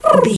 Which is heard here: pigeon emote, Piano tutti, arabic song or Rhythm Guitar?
pigeon emote